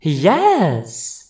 haha; rosalina; SM64 CO-OP

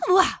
wah; pauline; SM64 CO-OP

